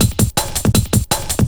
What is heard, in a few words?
Steel 162, breaks